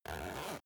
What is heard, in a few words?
zipper use short